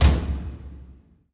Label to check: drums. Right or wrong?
Right